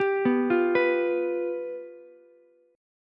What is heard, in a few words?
F Suspended 2nd Flat 5th Ukulele, chord; arpeggiated; Fsus2b5